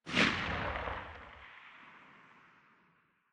hilo tail; Titanfall 2

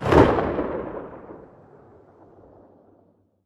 atk tail; Titanfall 2

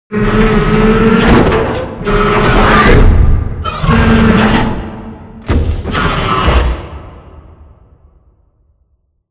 Assembly Wall Arm Close; Boomtown; Titanfall 2

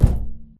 Footstep Jog Far; Titanfall 2

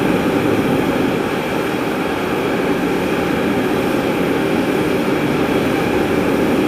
big steam vent; Titanfall 2

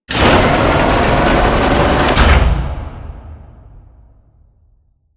Assembly Furniture Rail Close; Boomtown; Titanfall 2

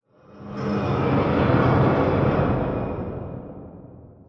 Assembly Plate Close Whoosh; Boomtown; Titanfall 2

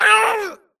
grunt death neck; Titanfall 2

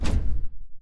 atlas footstep sprint; Titanfall 2

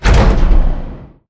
crane move stop clunk; Titanfall 2

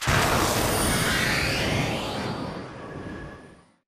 boost glide body; Titanfall 2

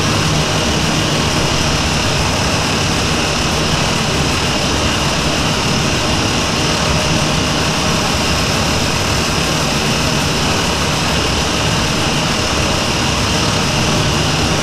Prop Rack Small Move Dist, Warehouse; Titanfall 2; Boomtown

